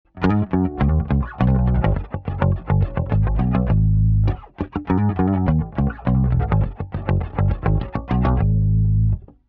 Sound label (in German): Quad Cortex bass chorus mit pick